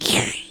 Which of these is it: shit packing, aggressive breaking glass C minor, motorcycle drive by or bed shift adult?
shit packing